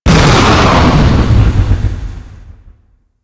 Fortress Bridge Door Explosion

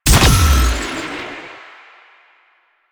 Power Slide Boost Ready Stinger, Motorcycle; Motorcycle Ninety Nine; Bullet Jump

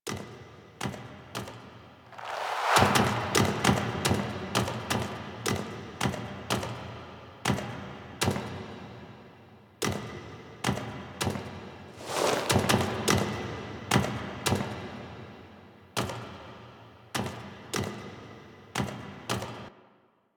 Sentient Throne Room Steps; The New War; Ballas

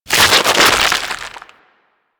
Ghoul Devourer Hook Tongue Projectile; Warframe; Grineer Ghouls

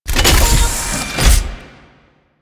Moa Fabricator Spawn Stop; Warframe; Quests; The New War; Veso

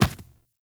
Infested Footsteps Medium Run Default; Enemies; Warframe

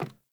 Sandals Run Wood, Footsteps; Characters; Player

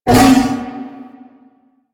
Wolf Attack Short; Animals; DE; Warframe; Quests; Duviri

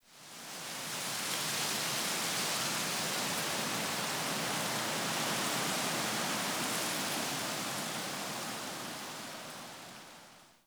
Day Wind Gust Calm; Ambience; One Offs; Glades Of Andurin

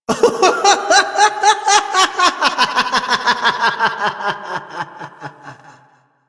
Laughter

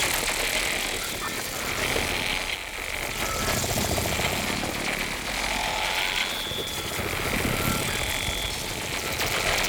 Hack Minigame Loop; Entrati

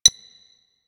Hub Concourse Computer Beep; Warframe; Ambience; Tenno Hub Concourse Fragment

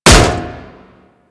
Staff Impact Metal

